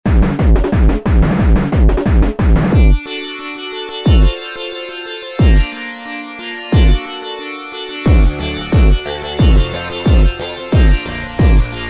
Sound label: instrumental